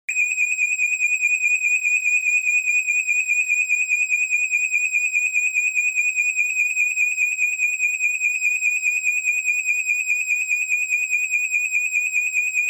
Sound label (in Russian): Pozharnaya signalizacciya